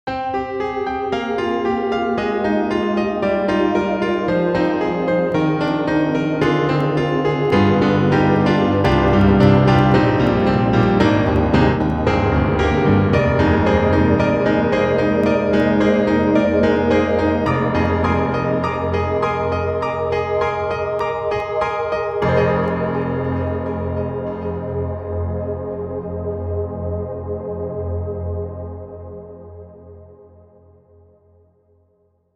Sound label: Micropiano, Trance Stack; Cakewalk; Rapture Expansion Pack 2; RGC Trance Stack 2